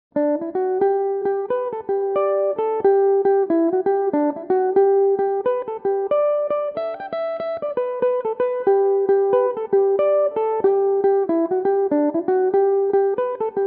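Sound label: slip jig g major, mandolin; The Honeybee; Belmuse EM-190 electric mandolin; Vincent Broderick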